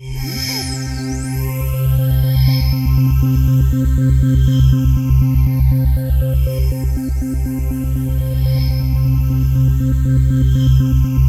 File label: PHULSE C3, PULSINGS; Zero-G Ambient 1